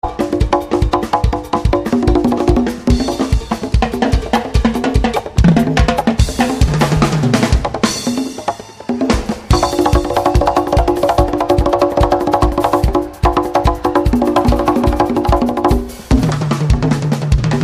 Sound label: Percussion Jam